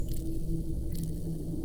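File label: Music Dpad Evaporate 145; From Dust; PS3; Xbox 360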